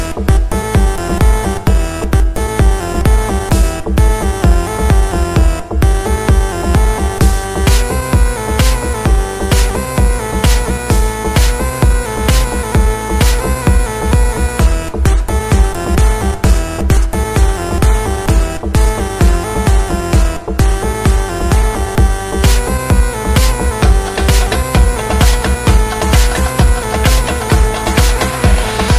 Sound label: house mix